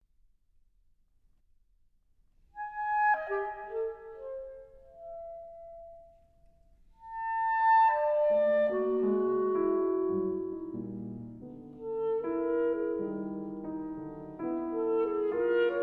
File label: Clarinet and Piano